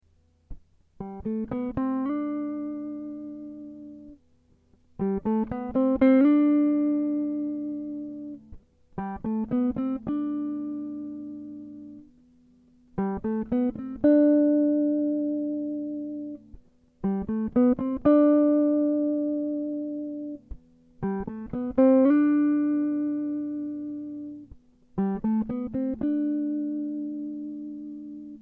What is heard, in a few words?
Dm 120 Jazz Chords Riff dry